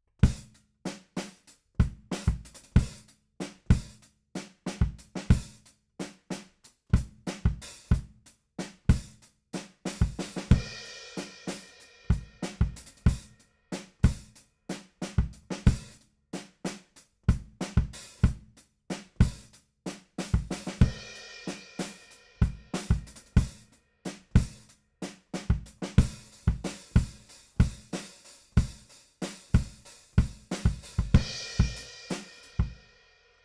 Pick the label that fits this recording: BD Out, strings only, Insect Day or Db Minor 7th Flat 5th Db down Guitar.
BD Out